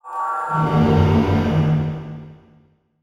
Title Transition Evolver; Production Element Title Transition

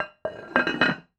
Toilet Back Put On, Household

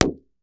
Cartoon Balloon Pop

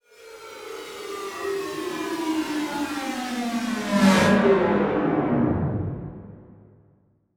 Title Transition Descend; Production Element Title Transition

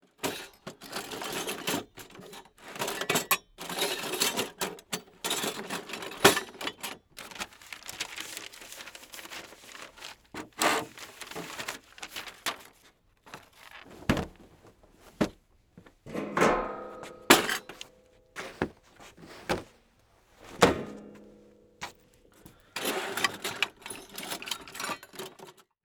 Human Rummaging Through Stuff

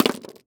Impact Object Metal Fall On Wood Floor Fast; Impacts

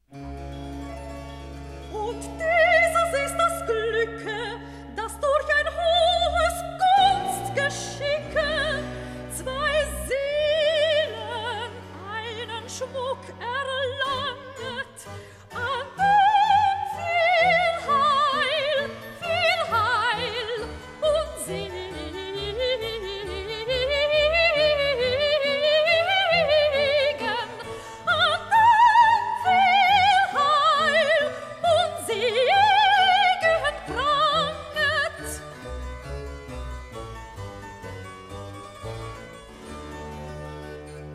Recitative; Bach; Famous Cantatas II

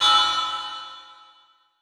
Multimedia Internet CD-Rom Flash Beep Low Pitch

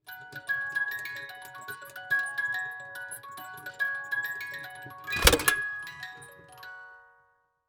Cartoon Jack In The Box Song And Pop Quickly; AdobeCC

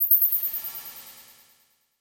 Multimedia Internet CD-Rom Flash Beep Multiple

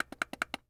Electronic Joystick Button Pushing; AdobeCC

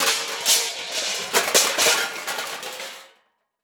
Crash Pots And Pans Falling On Kitchen Floor; Crashes

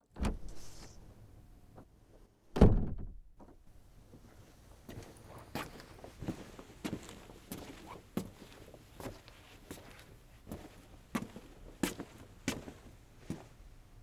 Human Enters Room And Moves Around

